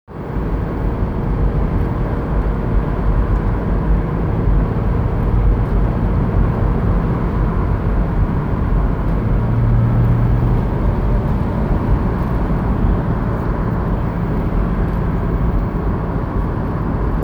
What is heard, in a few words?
weird boxster midthrottle hum